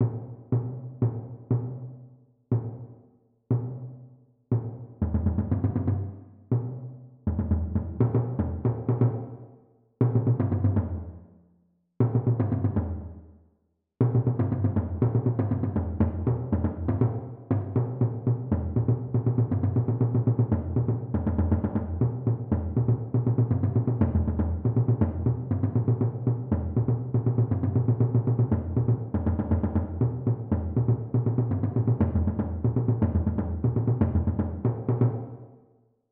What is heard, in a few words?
jams (toms)